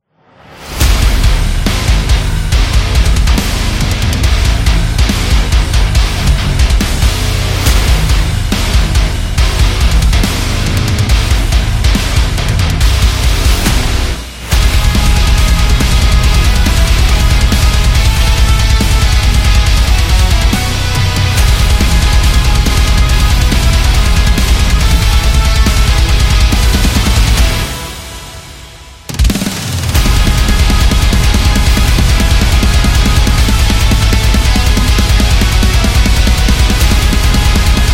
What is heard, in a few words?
Christmas Metal